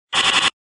card give; American Poker The Legends II

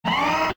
UI AUTO START; Russian

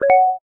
hit, keno; games; Bingo

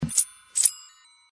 intro sfx; California Gold Rush

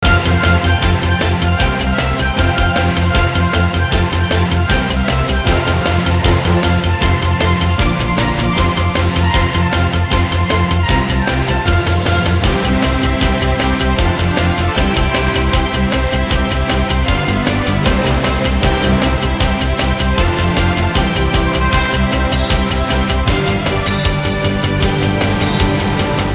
free spin melody; Admiral Nelson

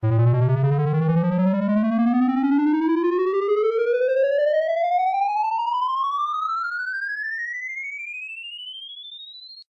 collect go; Fruits Royals

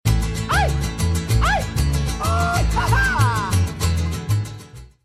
seven; Fruit Fiesta; Wazdan

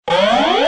gamble step; games; poker; American Poker The Legends II

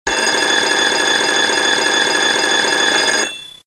RING LONG; Charming

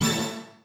prize hit; Wazdan

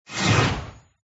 buff get; Thunder Fighter

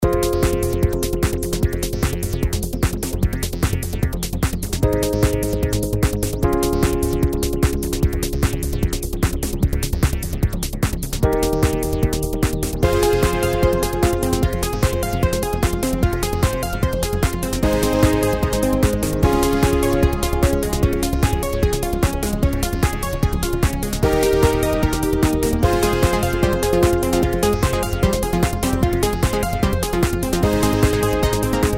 robots reelspin fg

